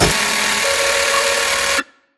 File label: Screen Glitch Long; Boomtown; Titanfall 2